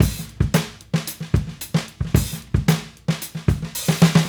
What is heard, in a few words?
Raw Funk Drums Fill; Sonic Foundry (Sony Creative Software); What it Is! 70s Analog Funk